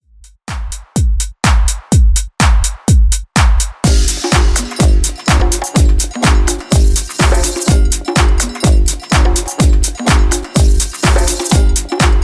Classic Mix 125Bpm

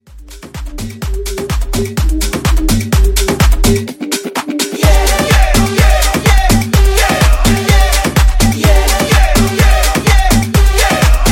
Mashup 126 CLEAN

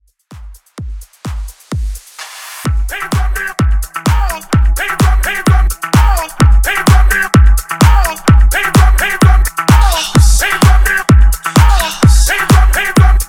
Dance 128 BPM

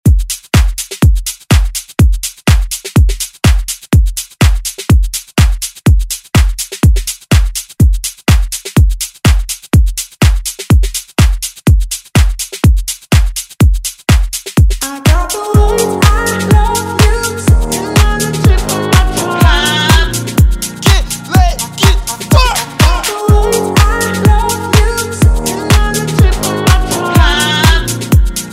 Dance Redrum

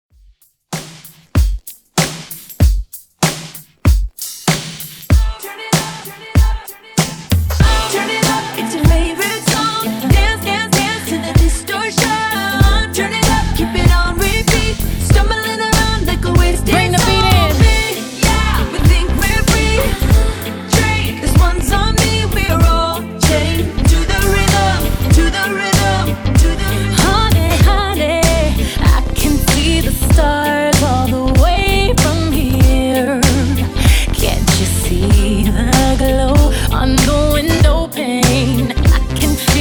96 Bpm; Redrum

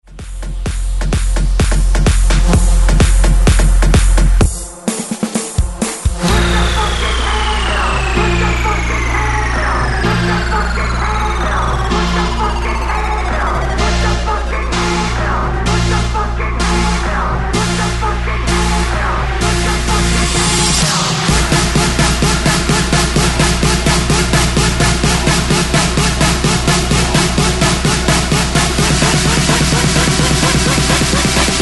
130 BREAKMIX

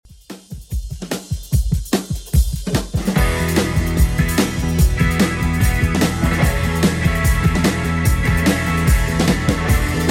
70s Redrum 147bpm

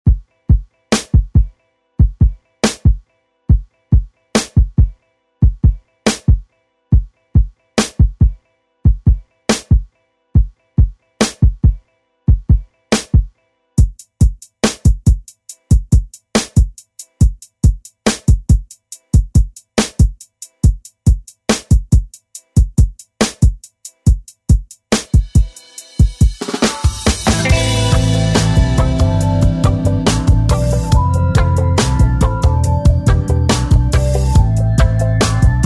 Rock Redrum; Deejay Hit

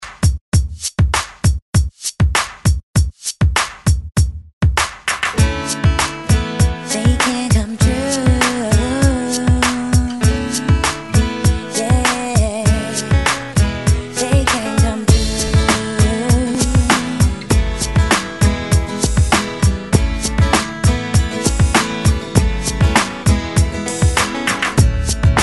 ReDrum 99 Bpm, 90s ReDrum; DJDOLLS; remixplanet

